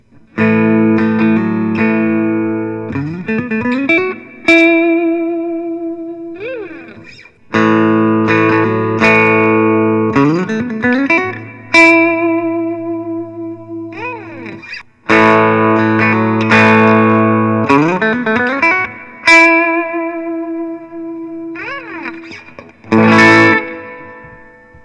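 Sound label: gibson custom 61 sg standard, guitar; vos; vintage cherry; reissue; 6 4 lbs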